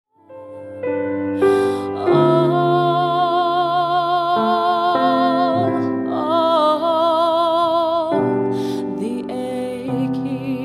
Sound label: wedding vocalist